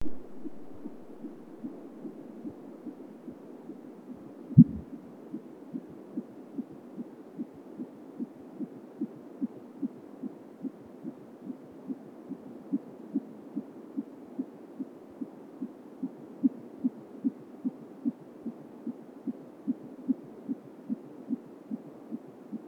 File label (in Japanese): 心音